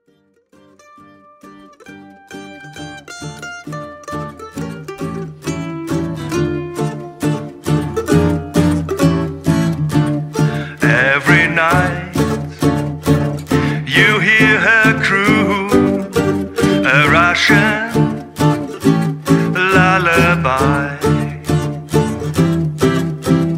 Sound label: Russian Lullabye